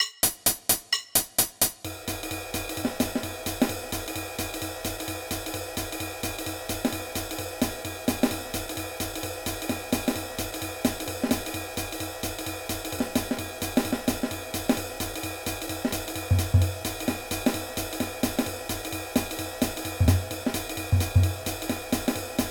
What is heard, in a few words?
Backing; jazz walking basslines; ragajunglism